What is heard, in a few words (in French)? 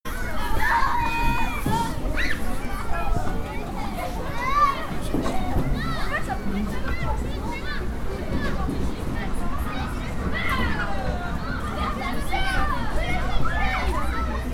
Ambiance Enfantine